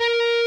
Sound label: solo